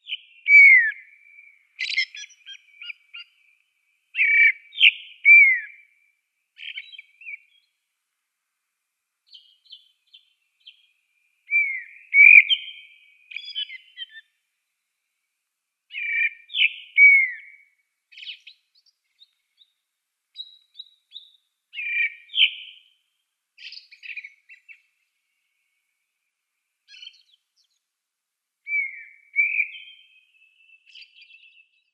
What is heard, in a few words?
Dusky Thrush song